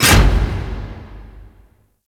Comet Pellet Move Stop; Gameplay; Warframe; Corpus Railjack; Comet Shredder

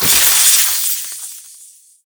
Spectral Sword End; Ultimate Abilities; Naramon; Warframe; Focus

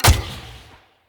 Bow Ranged Shot Charged; Weapons; Player; Envoy Hunter; Bows

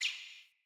Bird Single Chirp; Paragon Bird

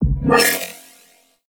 Shield Aura Attach Player; Warframe; Iron

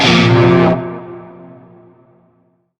Shawzin Blues Chord C; Music; Warframe; Narmer Shawzin

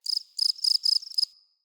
Forest Open Glade Cricket Close; Day

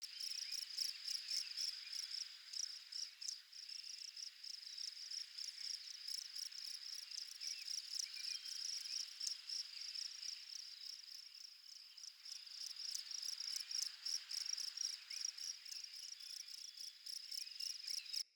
Insect Loop Exterior

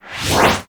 Cast Smoke Screen; Warframe; Ninja

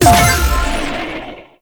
Moto Race Boost; Warframe; Motorcycle Racing

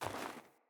Sandals Walk Snow, footsteps; Player

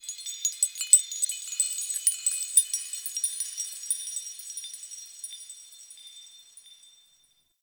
Zariman High Texture, Ambience; Warframe; The New War

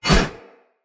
Curved Sword Whoosh Right Left; Weapons; Player; Swords; Sinecure

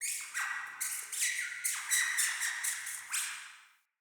Cave Mine Rat

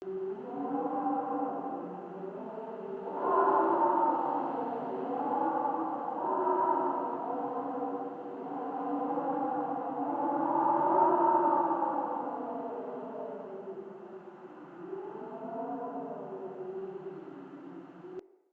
Cave Amb Loop, ambience; Caves; Glades Of Andurin

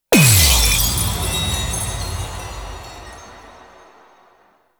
Void Tear Closed; Warframe; Cephalon Weave

